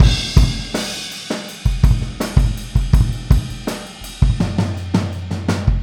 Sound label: Boogaloo Fill Kick, 82 BPM; Sonic Foundry; Drums from the Big Room The Mixes